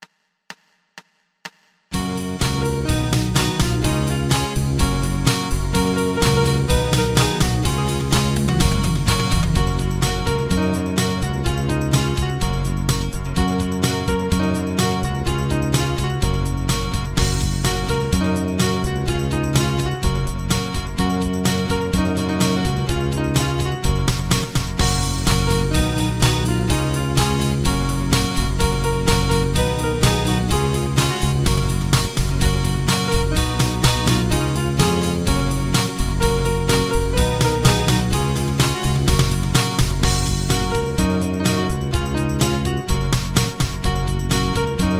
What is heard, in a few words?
Low Key